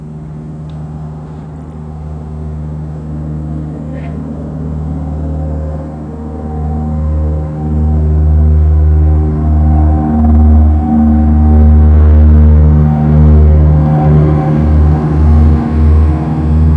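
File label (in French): decollage d'un avion de ligne, transport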